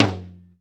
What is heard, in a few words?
Tom med